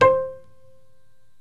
PITZ PIANO

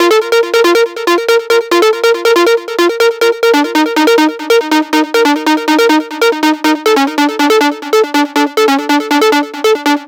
NRG Lead, trance

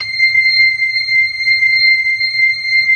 LO MAN V R, ORGAN LOWER MANUAL; Keith Emerson Signature Series

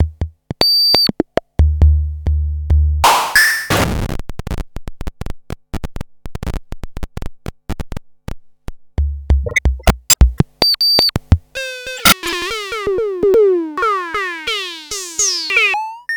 Syncussion Zapp

